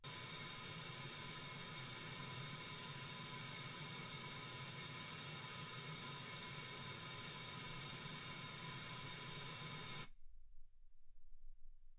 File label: wgn 48kHz